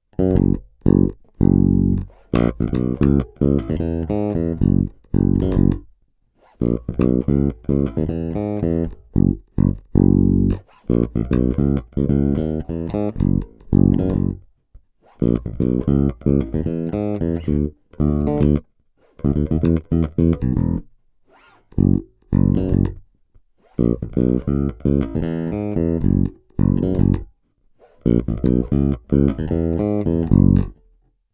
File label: Shortscale J Funk, basses